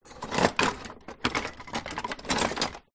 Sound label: large utensils movement in drawer; household; zapsplat